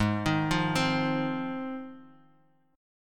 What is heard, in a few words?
G sharp 6th G sharp down Guitar Drop D, chord